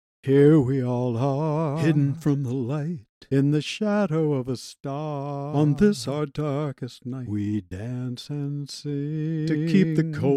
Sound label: Vox Only